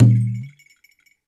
IYA ENU, World Percussion

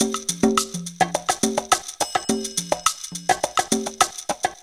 PERC LOOP 105, funky elements; Zero-G

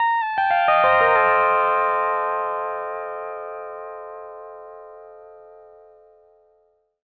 PD LOOPS 120, Whole Lotta Country

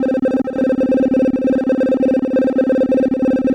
Kaossilator gated (130BPM); musicradar

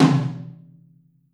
Drums Percussion Kit1 Room Mic

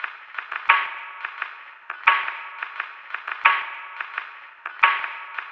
LoFi Funk 087, Lounge Drumz

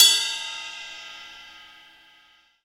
XV Ride, Roland XV-5080

